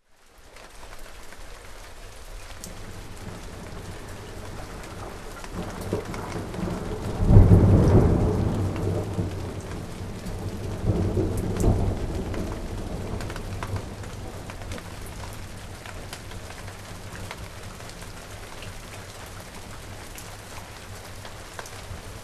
rain with thunder rumbling; salamisound

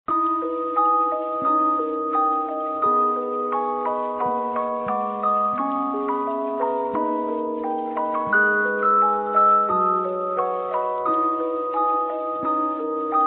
Music Box, Lofi; ヤリチンビッチ部; yarichin bitch club

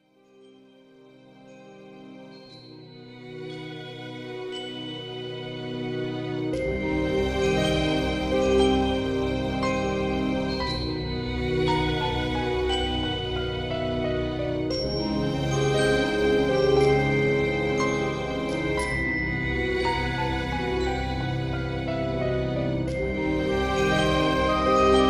Mystery; GoSoundtrack

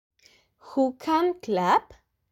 Clap

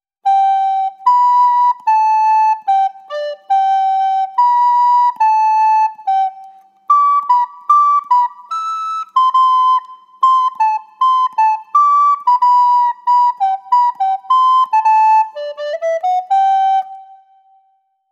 Adagio accelerando

